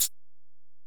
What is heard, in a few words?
Ac Perc, Drum Machines Alesis DM-PRO